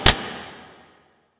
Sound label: Sticky Bomb Idle Catch; Grineer; Veilbreaker; Vor